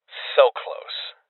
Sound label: Sniper Miss